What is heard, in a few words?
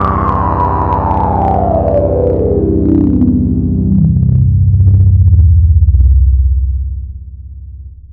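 2 Pole Filter sweep; Arturia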